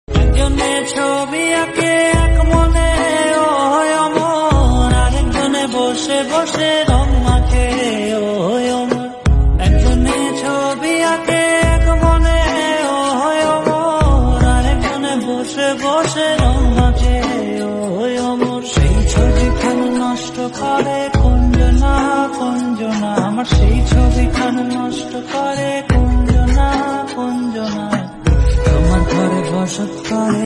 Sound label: instrumental, tamil song